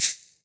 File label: Exotica Shaker